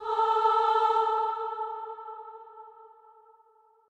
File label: aah; schattenlander